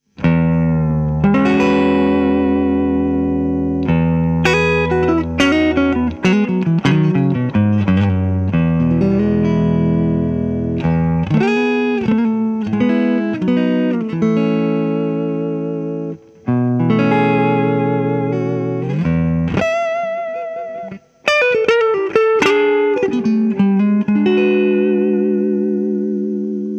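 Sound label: clean Strat Mic; Bluetone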